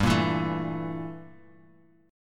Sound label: G Minor 6th Add 9th G down; Gm6add9 strummed; JGuitar